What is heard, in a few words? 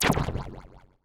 Laser Paintball